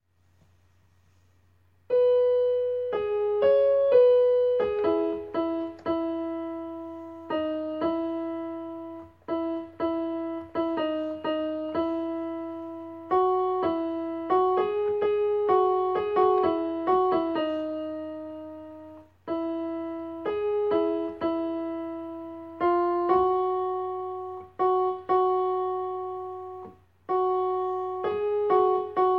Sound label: alto plonked